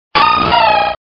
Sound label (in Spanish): Grito de Azumarill